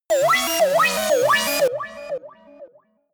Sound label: shot futuristic wobble ringing; digital; soundpool